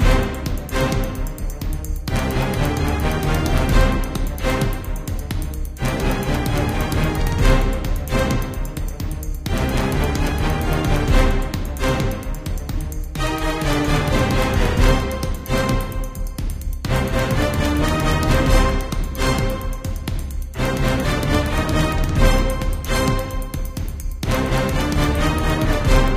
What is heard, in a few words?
music action; Secret Agent